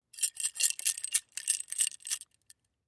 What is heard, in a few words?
Drink Shake Hard Ice; Drink Mixing; Foley